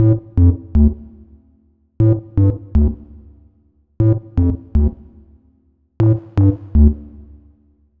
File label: Loop Melodics 120 A; Deep House; Mondy; Ghosthack; Advent Calendar 2019